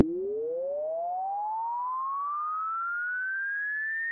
sweep res

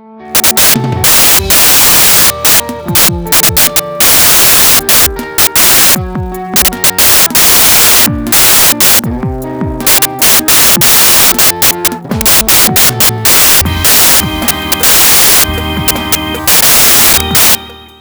drums electronic guit

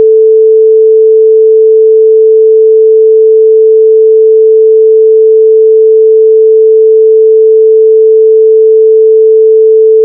Tone A

